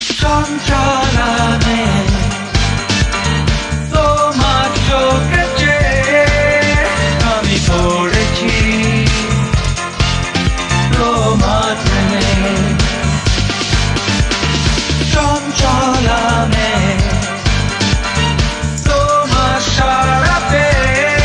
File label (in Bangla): Bangla Best Songs